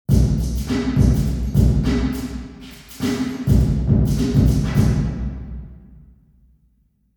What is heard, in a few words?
drum, jack lyons concert hall; university york